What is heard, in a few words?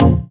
Synth Cuts; Vengeance Samples; VES1 Instruments